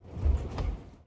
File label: soft road bump; Blind Drive